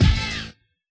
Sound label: Hit L, Yamaha DJX